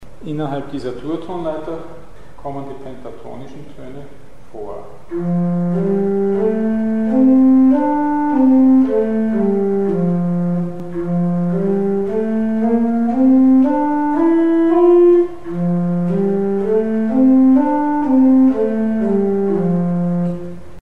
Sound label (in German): Pentatonik innerhalb der Siebenstufigen Durtonleiter; Mikrobuch